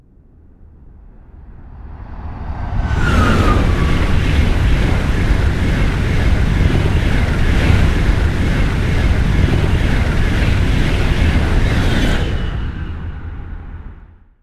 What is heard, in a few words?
train fast passing ICE; best service Blue Box